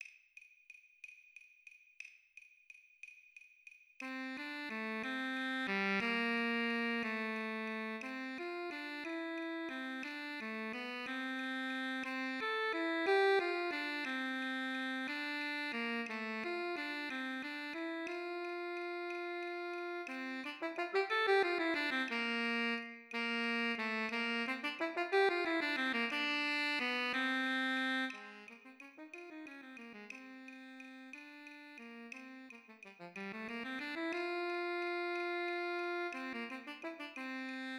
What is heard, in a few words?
Alto Bari Sax Play Along 60 BPM